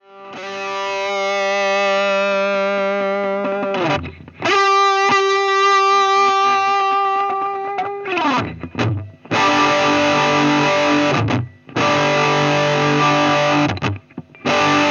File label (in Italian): higain con booster